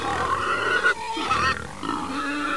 pig; World of Amiga; animals

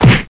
Slam

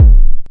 Drums; alone in the dark 4

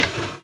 goblin Move; Keepsake; puzzles; puzzle Minotaur Maze

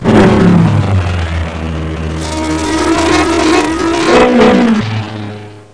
buzz

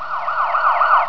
Siren

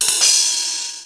SUCCES, JINGLES; MOBICLIC41

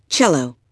cello

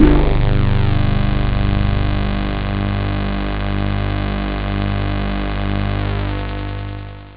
lo synth; OctaMED SoundStudio V1

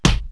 ham stick, impacts; Wired for Sound Pro CD; Aristosoft, Inc.

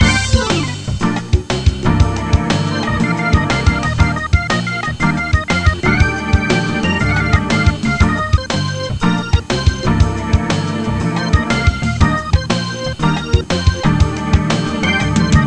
Night Music; Comedians - The People Behind the Laughter

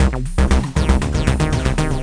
Blue Drum; Cloudz 1.0.0

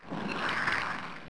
slime; dungeonmaster

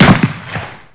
GUN

